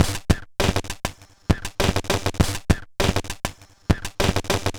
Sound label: Bent RX17 (100BPM), Circuit Bent